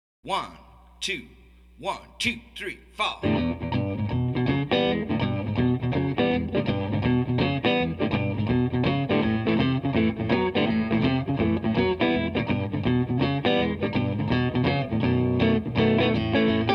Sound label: E Shuffle, harmonica; Blues Harmonica Masterclass